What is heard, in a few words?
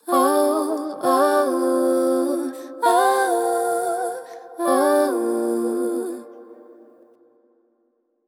Ad Lib Crystals - 130 BPM G Min Wet, Vocals; Cymatics; ZODIAC Beta Pack